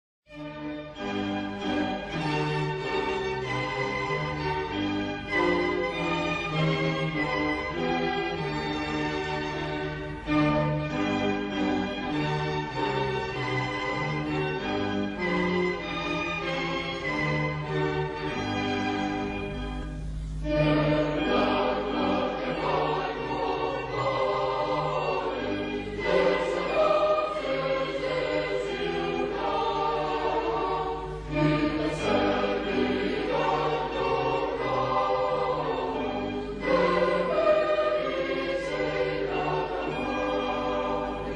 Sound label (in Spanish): Himno Nacional de Andorra; El Gran Carlemany